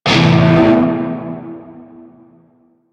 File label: Shawzin Pent Maj Chord A; Warframe; Narmer Shawzin